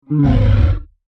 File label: Monster Grunt, Horror, Monster, Grunt, Creature; Monsters (Sound Effects:Horror); BlastWave